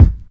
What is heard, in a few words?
classic hip hop kick; STYLE KITS (Hip Hop, Etc); Classic Hip Hop Mega Kit